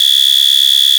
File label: Bottle Blow 2